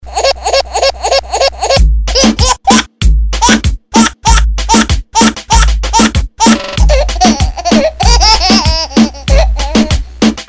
sound baby laughter

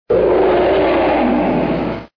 lion sound

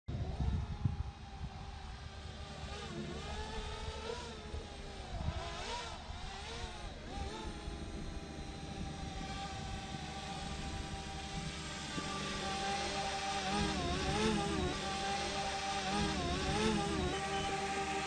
aircraft sound and effects

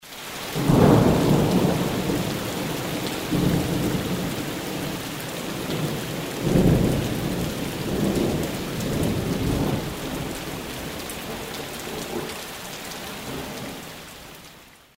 sound of thunder

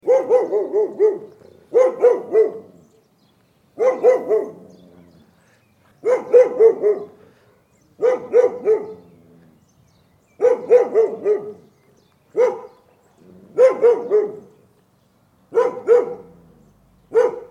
Sedaye Sage Roosta, dog voice

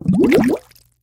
fart sound

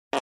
fart sound

